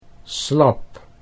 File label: slap